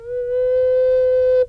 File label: Ocarina Note